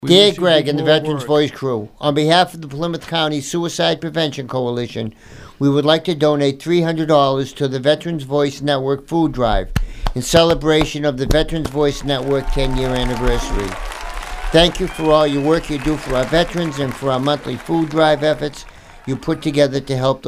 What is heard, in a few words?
brass